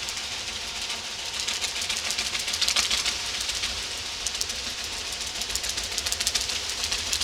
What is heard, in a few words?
ship noise